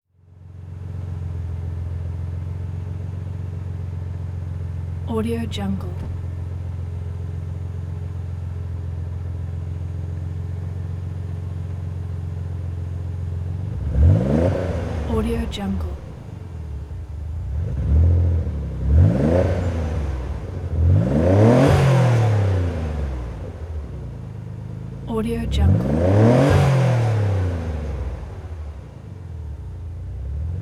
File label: turbo racing car idle and slow away; hollywoodedge